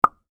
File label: pop